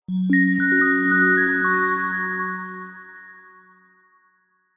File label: multimedia game sound relaxing game soft twinkling chimes goodbye finish